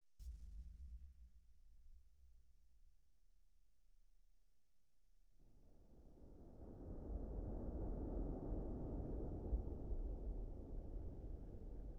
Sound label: Explosion, 5.1 Surround